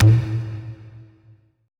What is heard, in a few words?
E TABLA A; ETH DRUMS